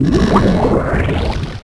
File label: Oyster Open; Finding Nemo; XBOX